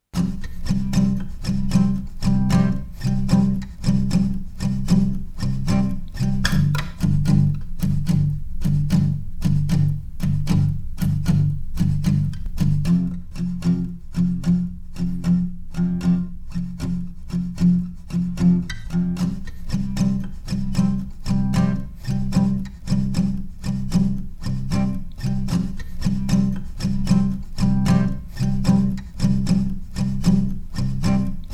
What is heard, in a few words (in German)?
gitarre